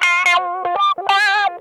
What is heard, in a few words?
TIMER, Funk Guitar; Zero G - Funk Guitar